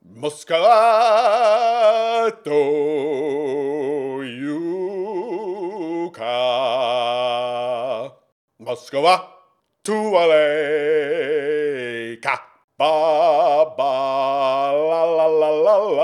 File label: sing; Hollow Knight: Silksong